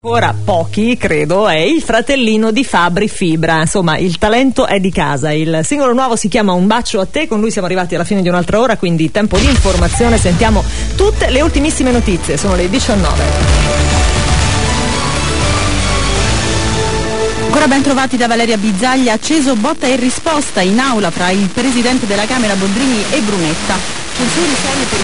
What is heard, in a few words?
italy, stations